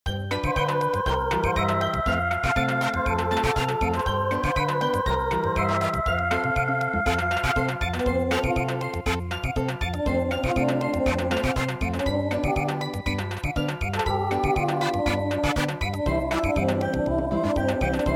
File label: Samba, traditional; midi